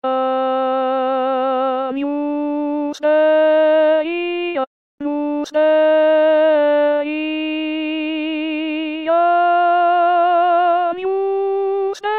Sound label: Agnus Dei Altos; La Messe O magnum Mysteriumde Tomas Luis de Victoria